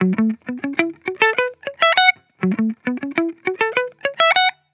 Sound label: Vibrations Pop Guitar Loop 100 BPM F# Maj; Cymatics; Vibrations Guitar Sample Pack